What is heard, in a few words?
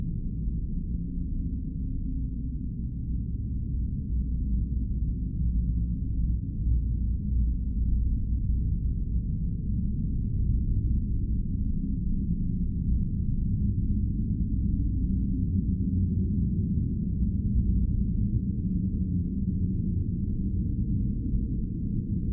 cavern; Golden Crayon Sounds